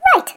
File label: Correct; RandPairsGame